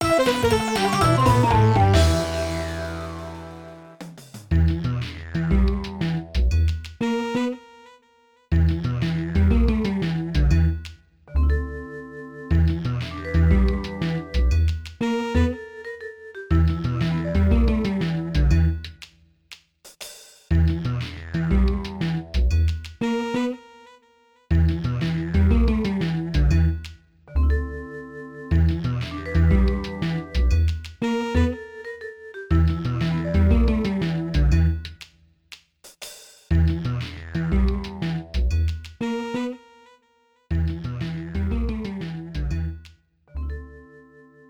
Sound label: Bomb Lose; WarioWare: Smooth Moves